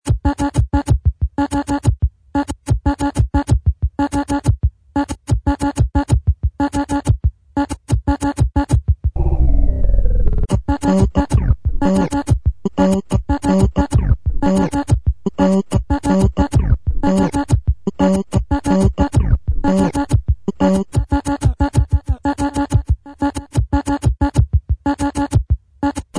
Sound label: Slice Funk